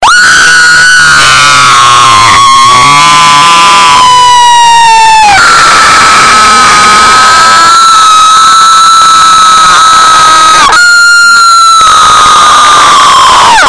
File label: scream